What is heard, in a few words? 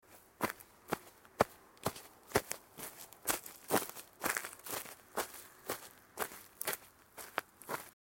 step; 草道を歩く